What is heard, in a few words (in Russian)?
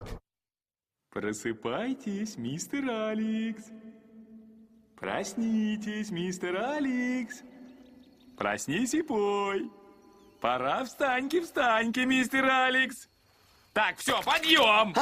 budilnik; madagaskar; mf madagaskar prosypajtes mister aleks